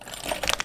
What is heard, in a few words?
lock Break; casualtiesunknown